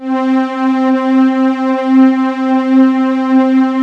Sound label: SUPR STRGS